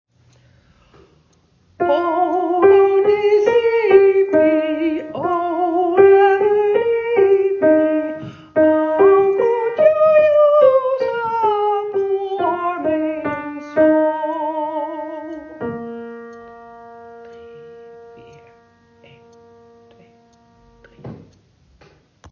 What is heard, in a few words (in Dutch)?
sopraan; koorleden